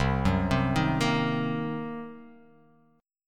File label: C Minor 7th Flat 5th, chord